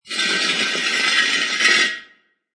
Wheel Drag Long, Car wheel without tyre being dragged on metal floor. Great metal on metal scr; Great metal on metal scraping sound. Metal slide, Long.; Dragging Sound Effects